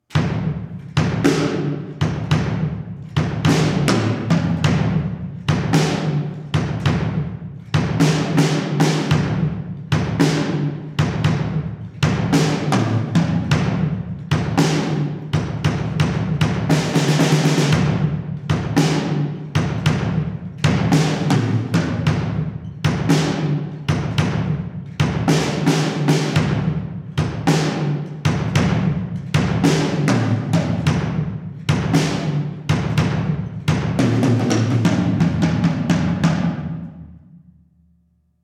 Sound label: Drum Set